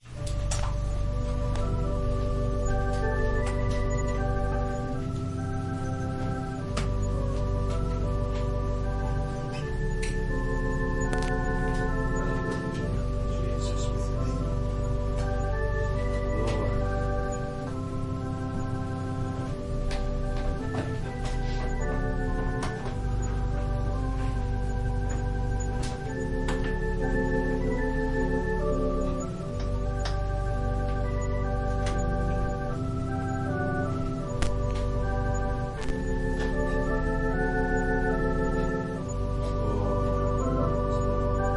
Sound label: Organ prelude